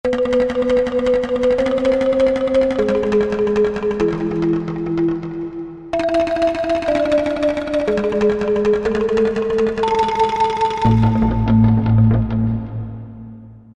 Bambu Trem, Korg M1; Factory preset examples

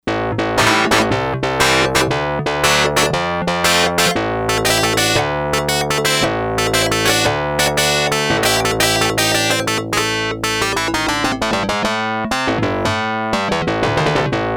Pick Pluck, Yamaha DX100; Factory Patches

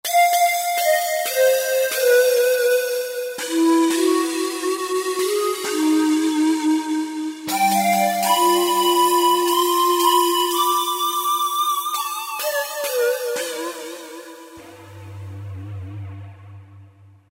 Roland JD-990; Panhandler; Factory Patches examples

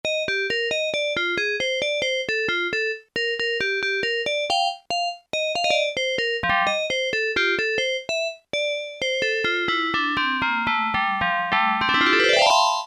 CELESTA, Yamaha SHS-10

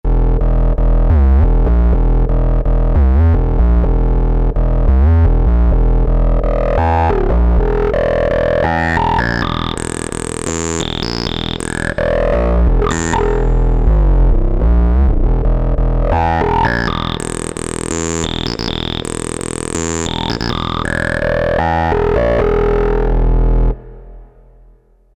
3oh3 Saw, Alesis Z2 EuroDance

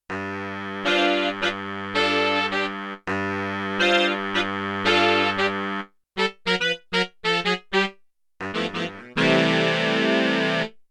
Big Band Performance; Yamaha TX16W Sound Library

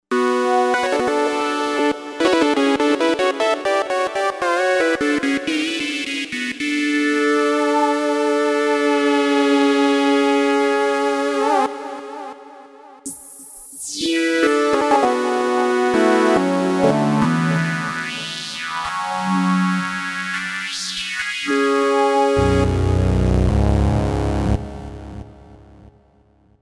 Space Age, Roland V-Synth

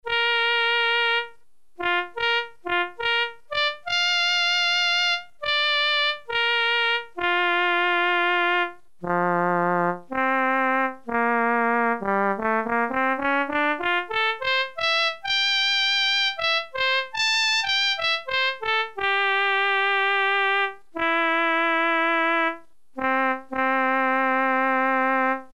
TRUMPET, Casio CZ-1000; Preset

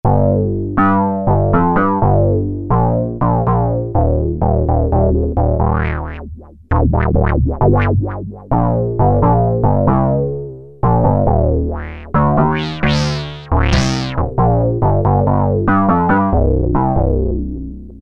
Bass; Cheetah MS6

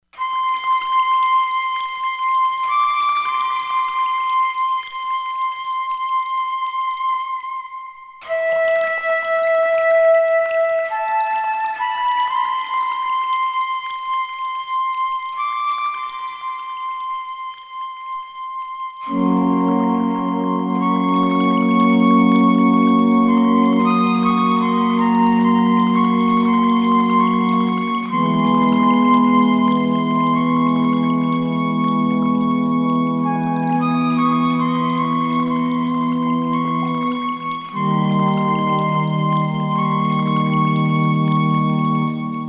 WaterFlute; Environment

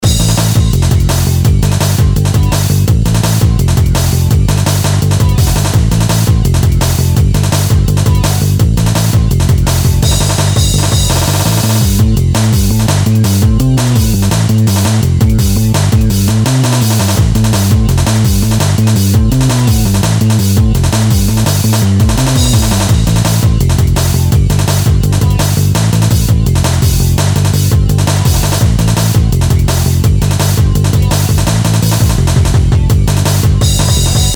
Rock Taste, Akai MPC1000

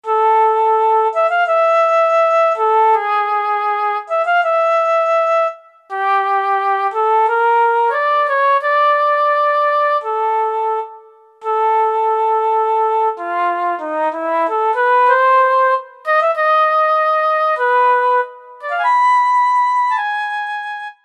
Flute, Roland U-220; Factory Patches

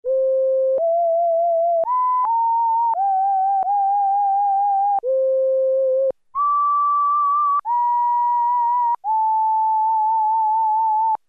Whistle; Factory Presets Bank B

